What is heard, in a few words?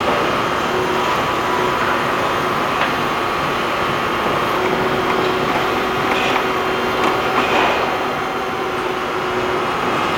construction ambience; avant gardens